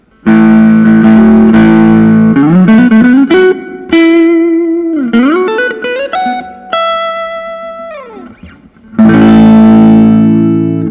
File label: 59 replica dirty lemon; wgil yaron